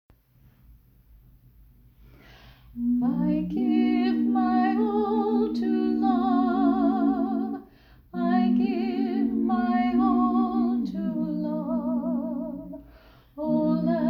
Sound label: Sopran Alto Learning Tracks